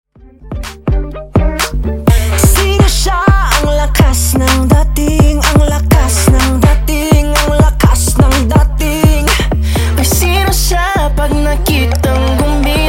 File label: Intro Outro, 125 bpm